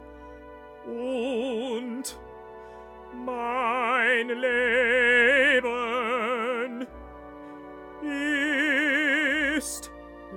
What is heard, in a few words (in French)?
Tutti; ein deutsches requiem de brahms part iii